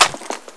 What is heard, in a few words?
xbow magin, weapons